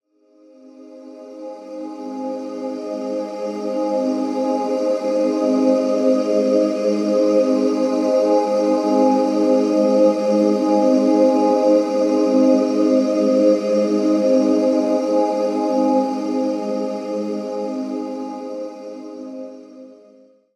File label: Pad D#, Color Bass; One-Shots; Pads; Ghosthack; Color Bass Essentials